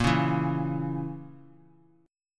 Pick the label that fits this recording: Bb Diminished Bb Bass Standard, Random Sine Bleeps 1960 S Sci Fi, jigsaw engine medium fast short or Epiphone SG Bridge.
Bb Diminished Bb Bass Standard